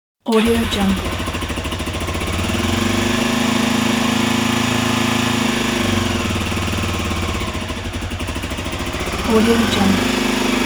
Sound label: motorcycle